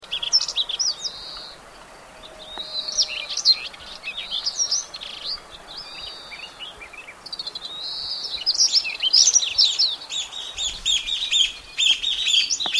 Daurian Redstart and Chinese Bamboo Partridge; NE Sichuan

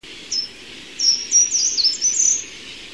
Certhia brachydactyla (song)

